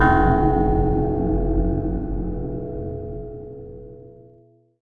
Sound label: bell; The Elder Scrolls III - Morrowind; Morrowind GOTY GOG